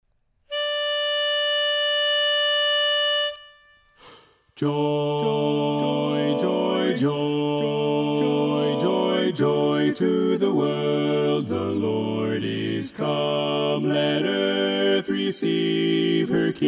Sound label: BASS, Joy To The World